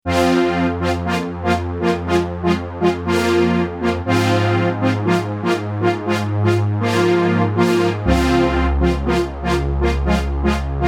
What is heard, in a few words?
sh 201 super saw brs